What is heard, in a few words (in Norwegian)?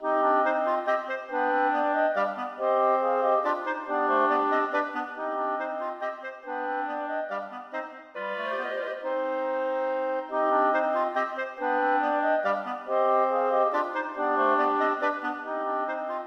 Menuet for fire klarinetter; Et øvelsesstykke for fire klarinetter; Aamodt 016d; KLARINETTKVARTETT